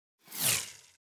Sci Fi Ultra High; Whooshes

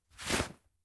Blinked Sleeve, Clothes

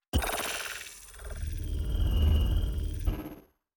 Robo Body Electro Fluctation High

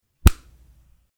Body Hit

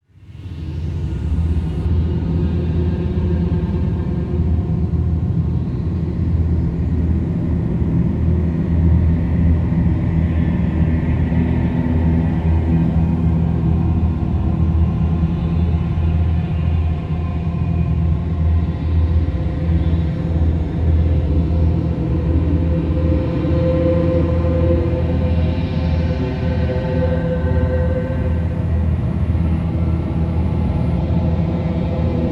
Drone; Drones

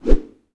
Swish Fast; Whooshes

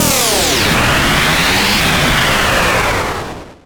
Distortion Digital

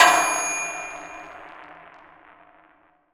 Cinematic Impact

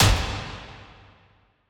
Impact Shoot Fast; Impacts Cinematic

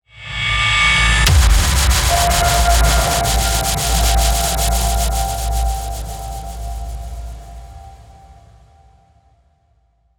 Tension Builder Impact

